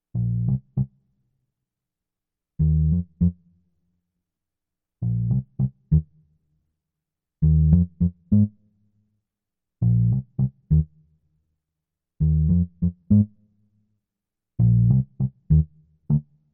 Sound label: bass